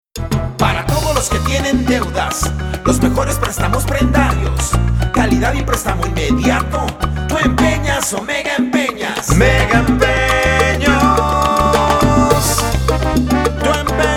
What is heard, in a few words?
JINGLE; MEGAEMPEOS